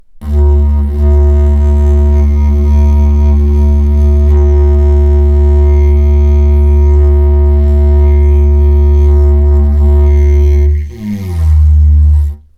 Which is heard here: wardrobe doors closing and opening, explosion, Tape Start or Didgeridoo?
Didgeridoo